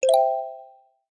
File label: message Box; racers word arranger